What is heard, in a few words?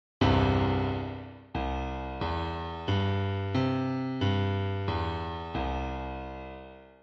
C3 Grand Piano Triad